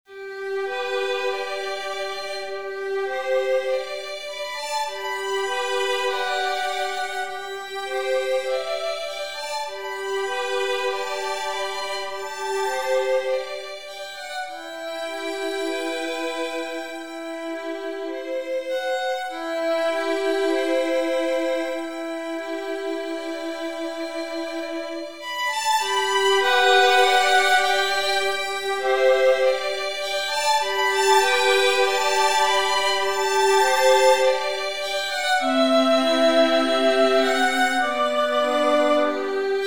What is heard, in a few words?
strings hoerbeispiel